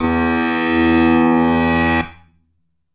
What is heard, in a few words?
Moo Piano E2, moo backwards piano